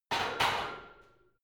barbell heavy plates replace rack reverby, fitnessstudio